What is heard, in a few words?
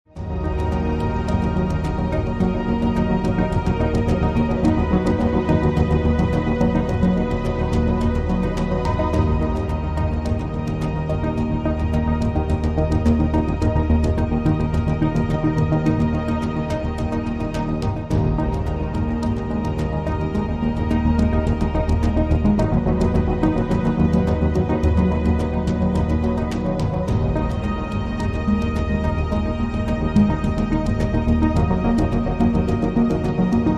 loop